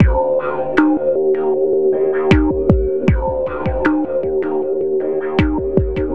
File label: THROATER, LOOPS